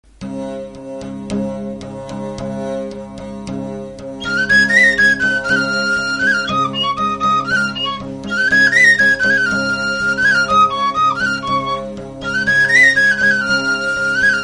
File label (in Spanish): salterio